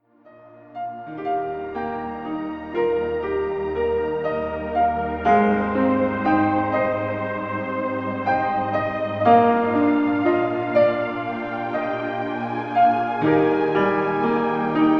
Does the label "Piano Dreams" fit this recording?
Yes